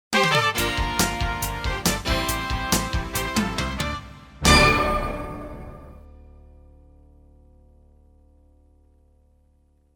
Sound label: Lively Horns